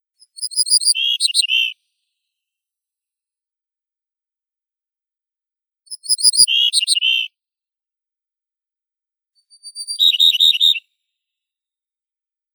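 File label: rock bunting, Emberiza buchanani; ovsyanka skalnaya; zvuki prirodi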